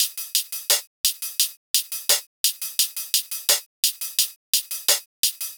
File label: Drum 172 top; Funker